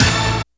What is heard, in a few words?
DANCE HIT; E-MU Formula 4000 Series Vol. 2